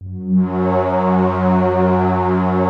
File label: APPROACH, PADS; Masterbits; Vintage Voltage